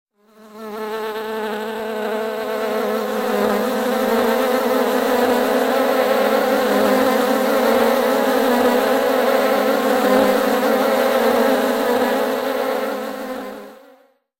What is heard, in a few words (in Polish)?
Pszczoły